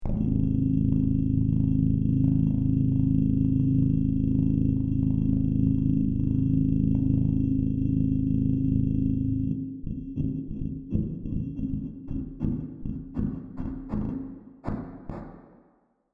Hovercraft SciFi Stalls at End, Hovercraft Start Up Then Stalls; Mechanical Futuristic High - Tech Scifi Science Fiction; Choppers; Sound Design